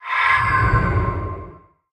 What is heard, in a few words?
Scatter Add; rage of the seas